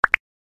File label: button tiny